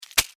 Scorpion Tail Snap, Scorpion, Tail, Snap, Stinger, Attack, Arachnid, Insect, Bug; Sound Effects:Animals:Insects; Scorpions; Blastwave